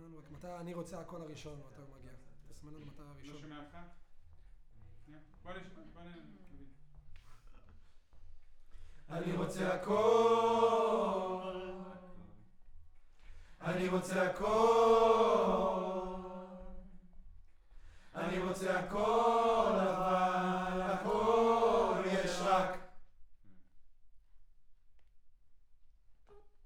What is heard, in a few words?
Choir